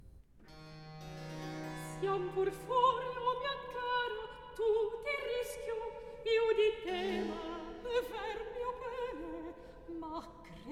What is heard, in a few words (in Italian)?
Recitativo; Siam pur fuori; Handel Masterworks; Act I